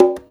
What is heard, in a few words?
CONGAS; Percussion; InVision Interactive; Percussion Slam; SULTAN